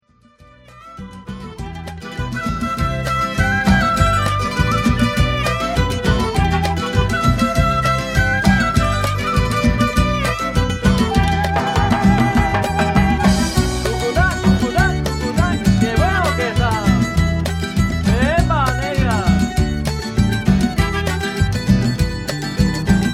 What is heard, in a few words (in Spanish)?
guitarrita